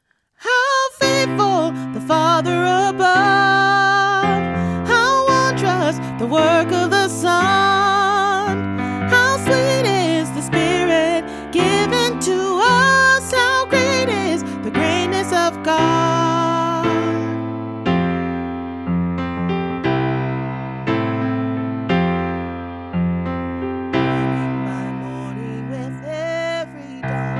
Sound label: Sop w Lead, christmas choir